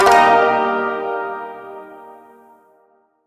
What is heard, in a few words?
Shawzin Pent Min Chord J; Warframe